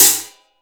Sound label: FLANGE 14 HH